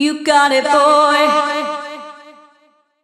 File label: future bass house loop